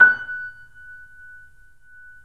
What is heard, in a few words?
3D Pianos Yamaha C7; E-MU Producer Series Vol. 5